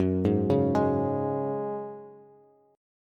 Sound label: F sharp Suspended 2nd, Bass; arpeggiated; JGuitar